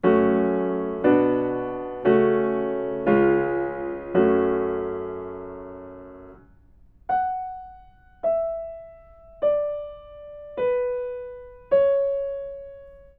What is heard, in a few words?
melodic fragments